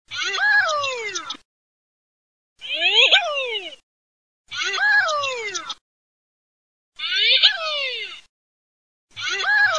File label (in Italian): MORIGLIONE